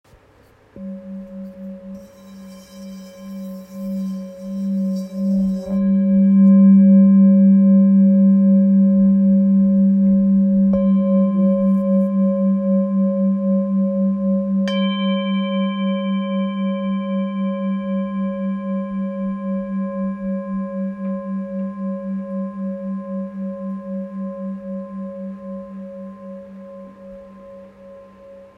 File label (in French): Bol ancien sol